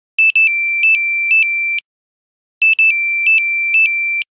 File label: Nokia Buzzer City bird; Windows Phone apps